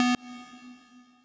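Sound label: square lead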